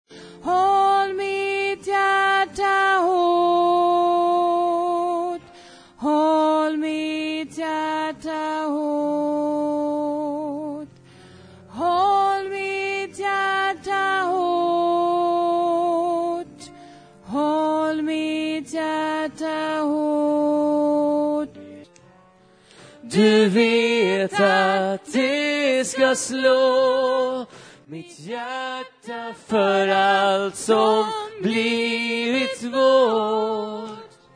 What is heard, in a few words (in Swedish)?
bas